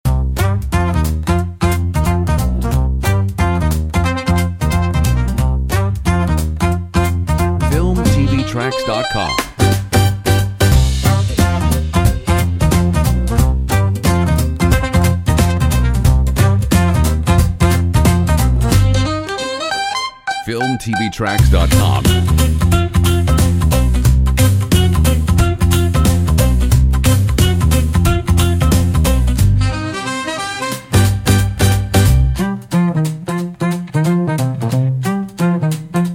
BOSSA HIP HOP; comedy funny

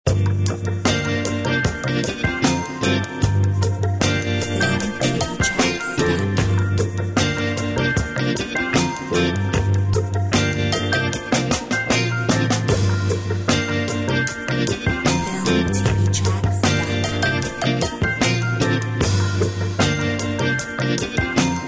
Vintage Funk

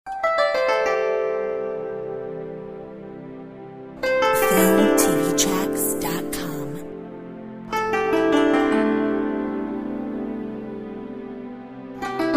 Chinese garden

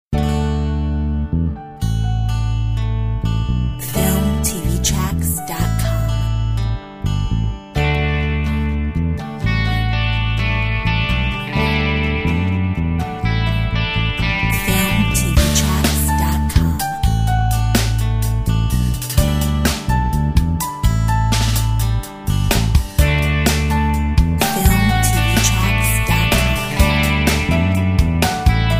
Something new piano

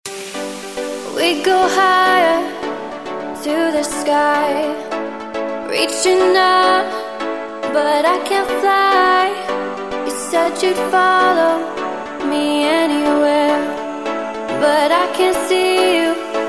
Time to celebrate Vocal

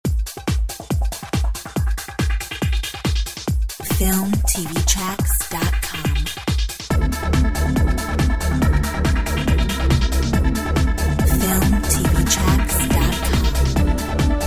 Amsterdam techno

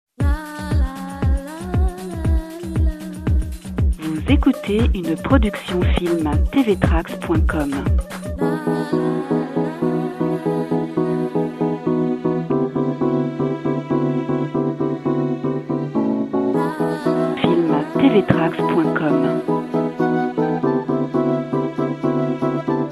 Samba

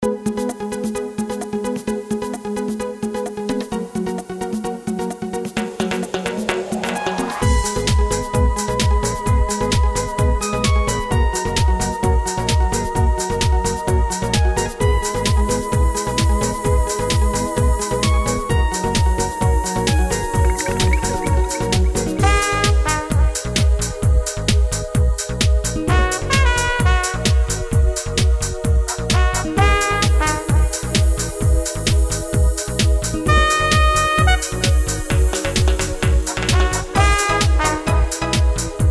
Joyful trumpet; FILMTV-TRACKS

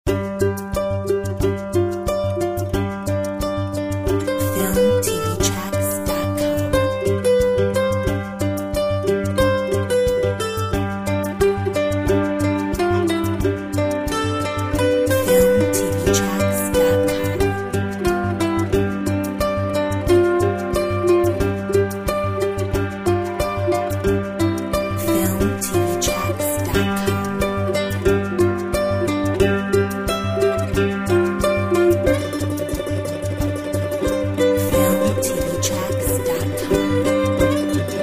Mandolin Magic

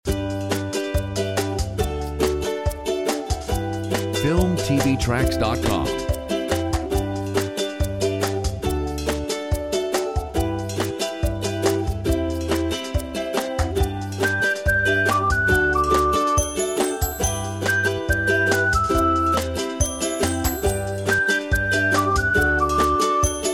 Sweet Ukulele

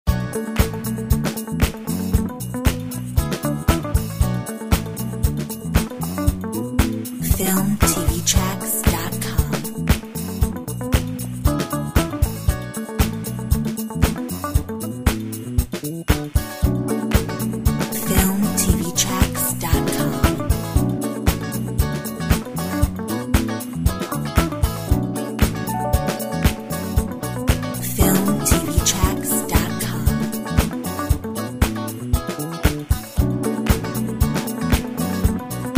disco

